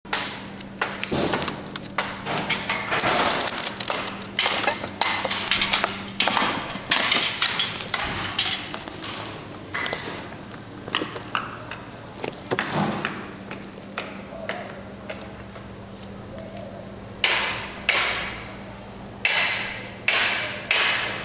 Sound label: hammer demolition